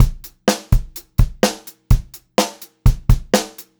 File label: 126 Pop-Rock Drumloops